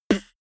beatbox snare punchy damped

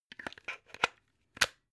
plug plug in clatter

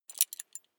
metal belt buckle clatter heavy

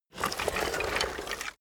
bones rustling movement

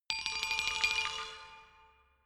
glockenspiel mysterious tremolo low short

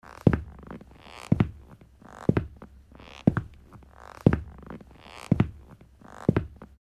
steps on parquet floor

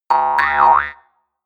mouth harp

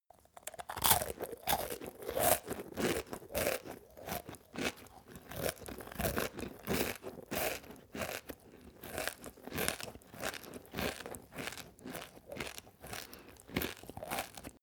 male open eat cereals

